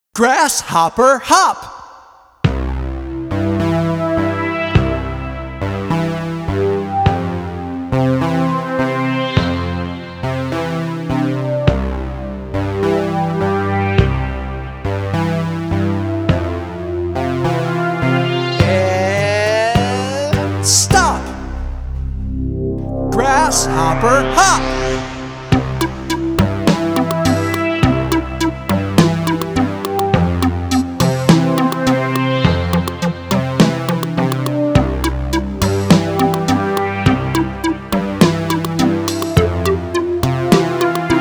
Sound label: Grasshopper Hop Instrumental